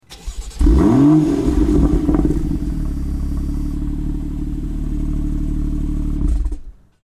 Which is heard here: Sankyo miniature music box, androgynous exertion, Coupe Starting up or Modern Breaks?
Coupe Starting up